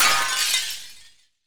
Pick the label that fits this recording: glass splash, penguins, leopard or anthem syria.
glass splash